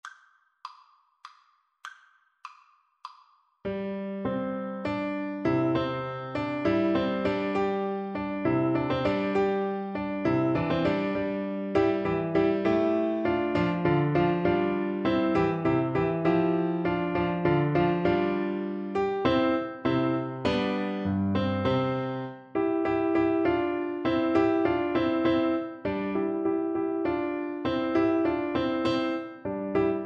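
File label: xylophone, Percussion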